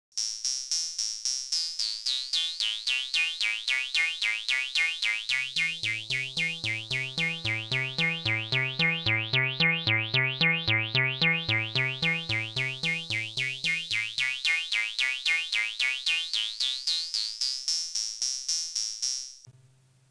Earthquaker Devices Spatial Delivery Synth Envelope Filter Sweep HP BP LP